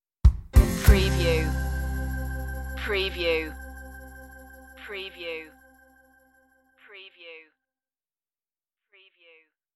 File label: hickabilly stinger